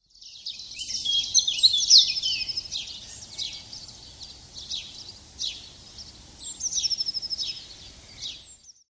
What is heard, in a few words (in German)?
voegel